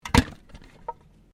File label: Can Softdrink Rubbish